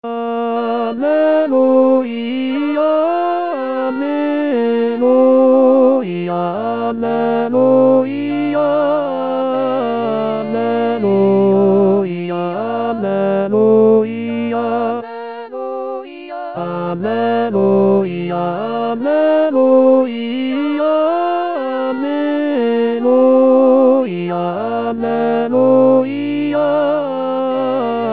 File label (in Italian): alleluia tenore; alleluia gabrieli